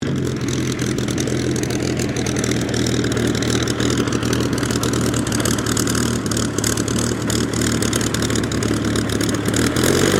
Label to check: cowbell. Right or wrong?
Wrong